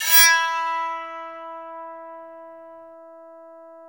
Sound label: BOWED PSA, Bowed Psaltry